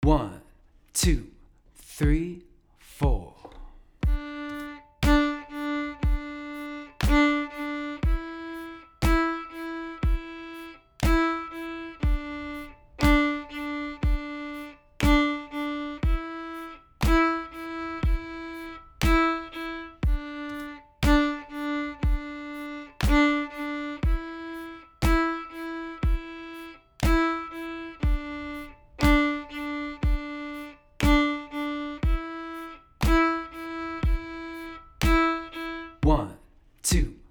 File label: offbeat accents hoedown